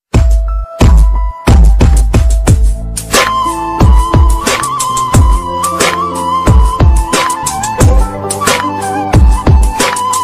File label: turkish ringtone; ringtone factory bd